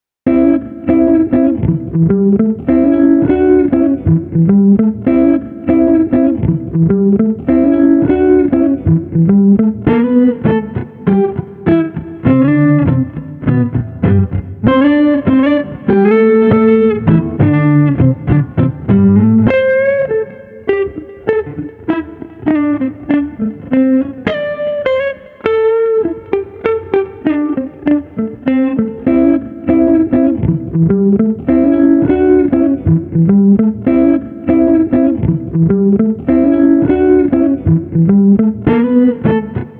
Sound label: Guitar Jazz Smooth 100